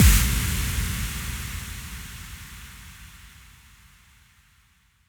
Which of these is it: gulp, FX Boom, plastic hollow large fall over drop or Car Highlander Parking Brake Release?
FX Boom